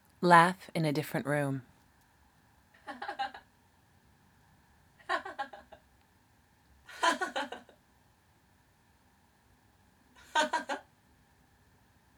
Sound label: Laugh Close